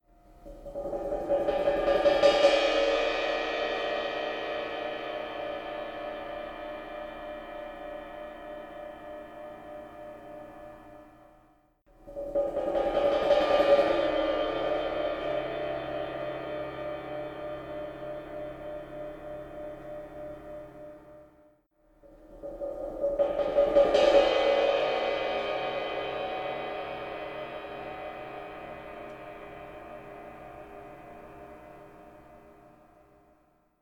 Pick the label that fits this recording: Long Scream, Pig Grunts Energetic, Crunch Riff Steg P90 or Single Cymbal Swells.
Single Cymbal Swells